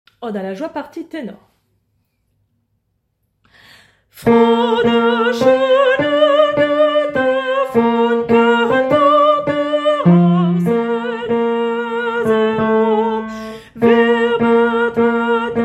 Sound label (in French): partie tenor